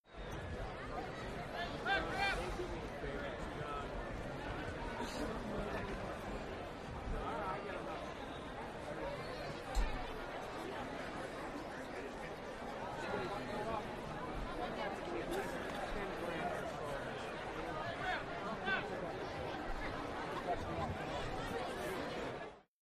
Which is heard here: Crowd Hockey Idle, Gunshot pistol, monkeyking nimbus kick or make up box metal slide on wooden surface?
Crowd Hockey Idle